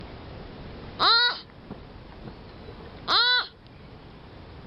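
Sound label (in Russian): lenivec, sloth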